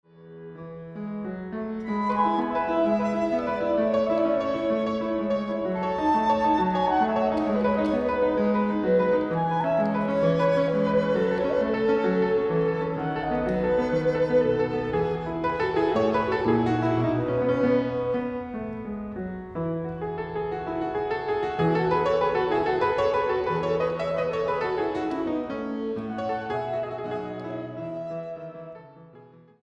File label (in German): Sonate A-Dur Vivace